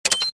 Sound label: switch